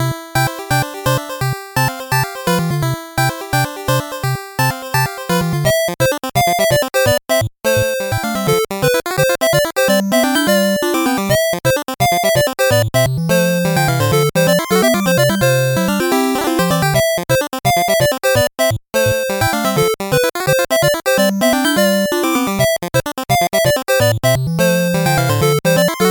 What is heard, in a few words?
8bit bgm normal